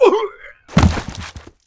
Death; warcraft3; Peon

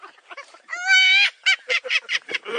laugh

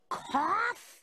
COFF; marge simpson

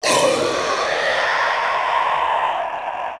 Angry, faerie dragon; warcraft3

